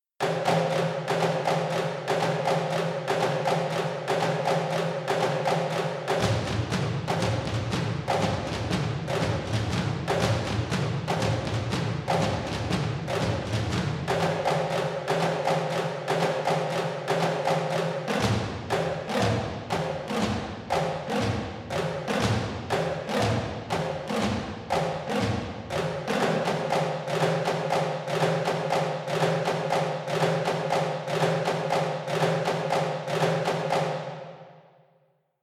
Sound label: drums; Spitfire Audio; Albion One